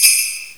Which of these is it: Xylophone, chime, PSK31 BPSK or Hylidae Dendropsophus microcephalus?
chime